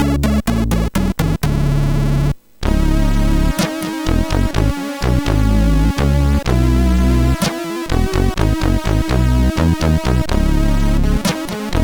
Ingame Jingle, MOS6581R4

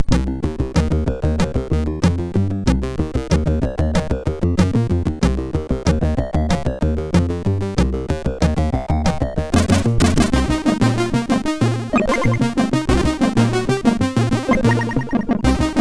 Mad4, MOS6581R4

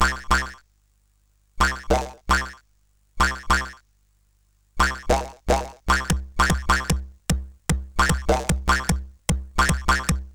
Boing, CSG8580R5

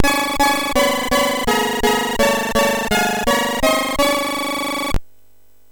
Sweep, MOS6581R3

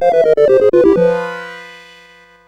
CSG8580

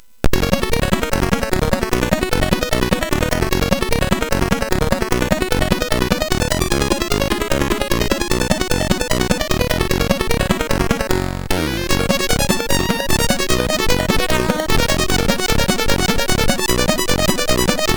Crowd, sid MOS6581R4

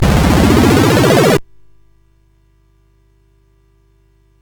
Dragonworld sid CSG8580R5

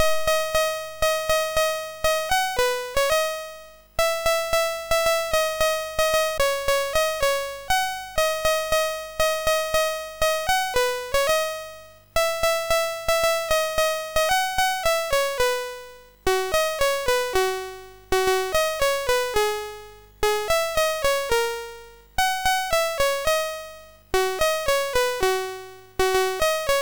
Jingle Bells, MOS6581R3; sid

